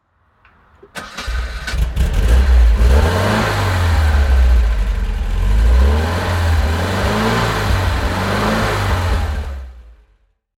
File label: Jensen-Healey 1974 - Start